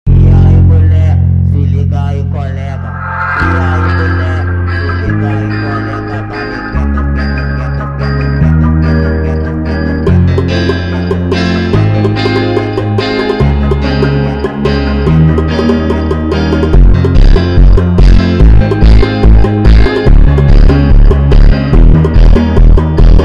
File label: funk sped up